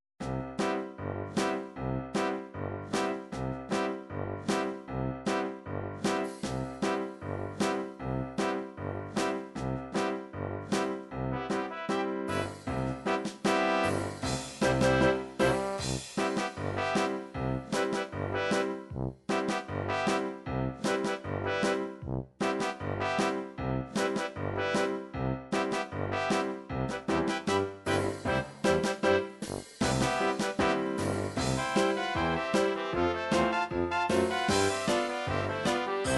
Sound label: ragtime, midi